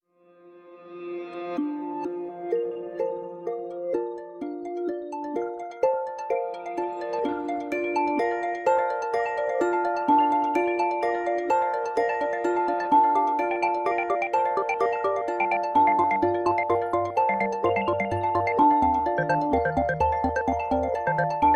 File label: Evolve, Ringtones; Cisco Collaboration